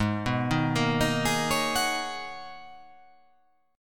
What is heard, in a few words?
G sharp Minor 11th G sharp, chord